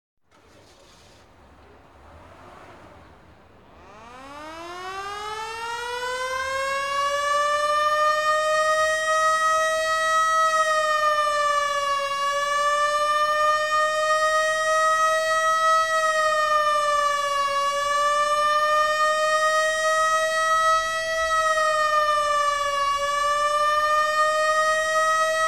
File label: Ambulance siren (American); Skylines & City Sounds